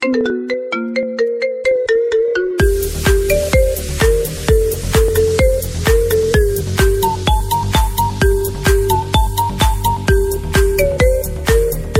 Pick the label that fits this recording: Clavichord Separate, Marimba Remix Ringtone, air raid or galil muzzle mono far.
Marimba Remix Ringtone